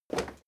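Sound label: step crowd run quiet single right, footsteps; schritte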